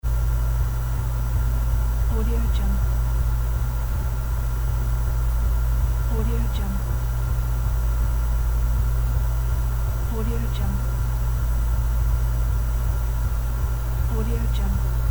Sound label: boiler room